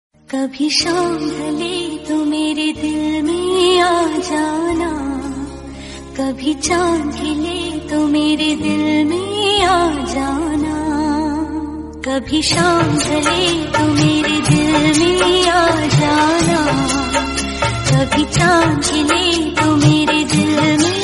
Hindi Song Ringtone

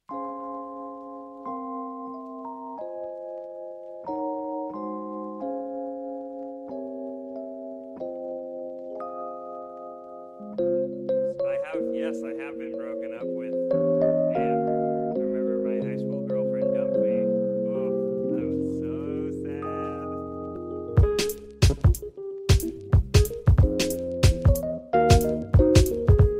LoFi, Instrumental Ringtone; ringtones

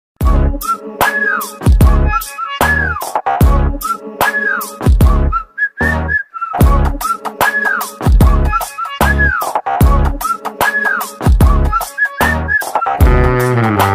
Whistle Ringtone

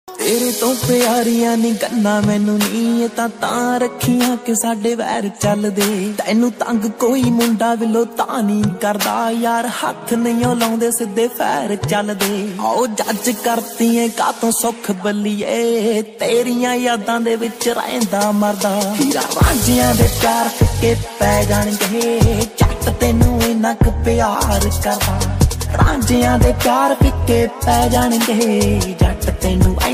Punjabi Ringtone

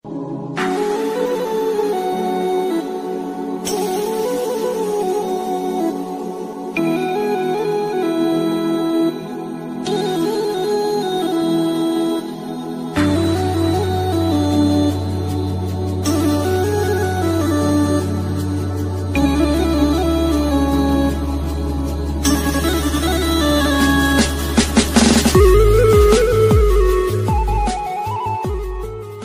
Turkish Bgm Remix Ringtone; ringtones

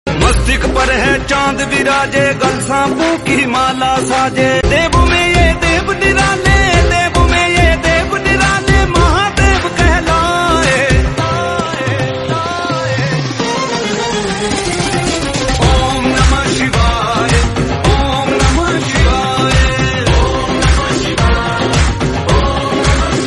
Om Namah Shivay Bhajan Song Ringtone

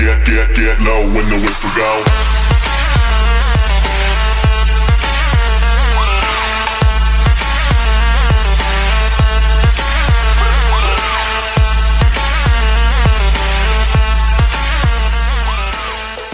Samsung Ringtone

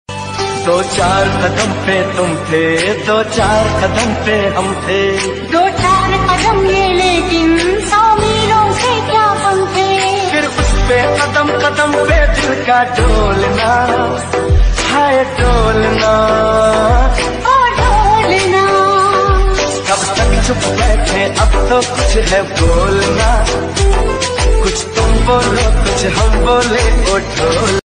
Marathi Ringtone

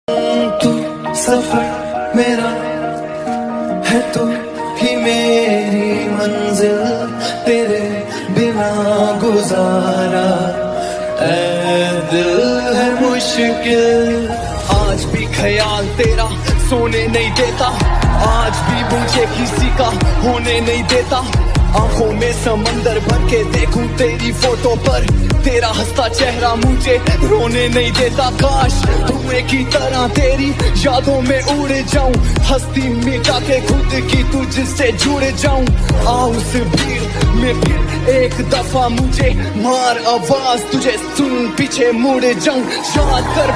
Sad Rap Ringtone

